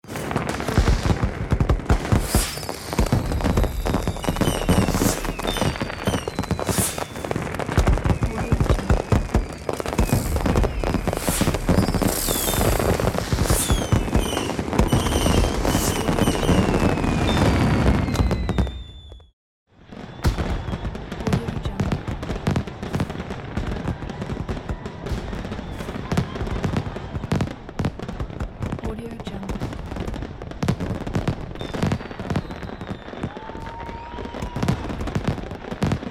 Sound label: fireworks exploding